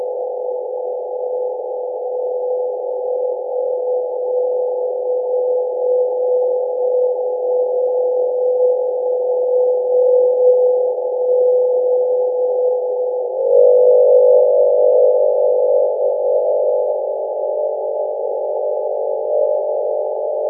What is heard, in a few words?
The Sound of MOXIE at Work on Mars